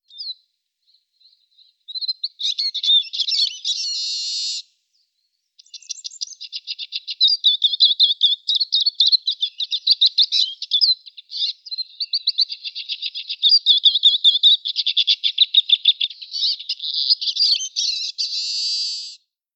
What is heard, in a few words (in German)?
Erlenzeisig Carduelis spinus